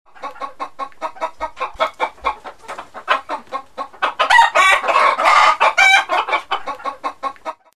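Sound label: hens clucking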